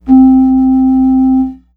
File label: Yamaha PSS-140 Flute C4